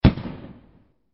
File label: explosion small; revolution